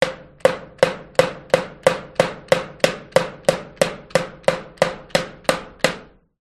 impacts metal bang plastic object multiple hammering